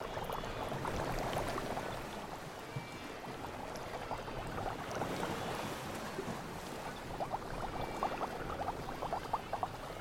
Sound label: loading sound